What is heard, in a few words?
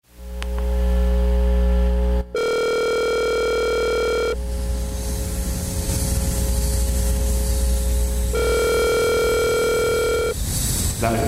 Wake Up Call